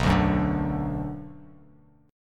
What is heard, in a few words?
B Minor B, Bass Standard; strummed; JGuitar